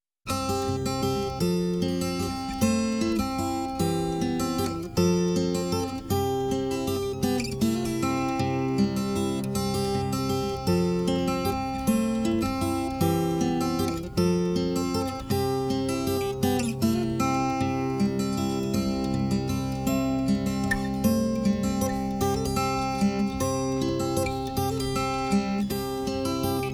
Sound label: music strings